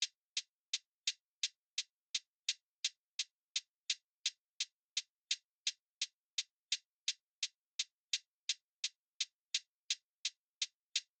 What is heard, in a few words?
Drum Loop, 85 BPM Hihat, Lofi; Loop Stems; Cymatics; GHOST (Beta Pack); Breach Drum Loop